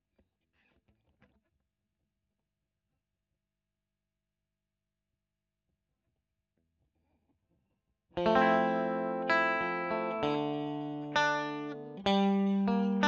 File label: E.GTR Royer